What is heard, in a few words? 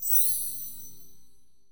match